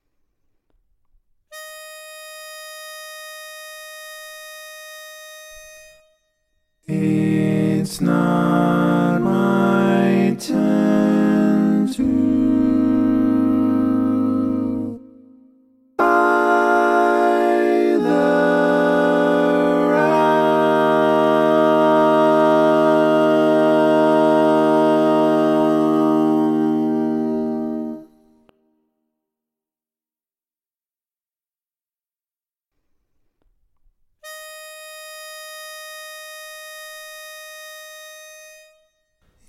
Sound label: barbershop tags, all parts